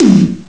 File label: mega tom; Amiga Soundtracker Sample Packs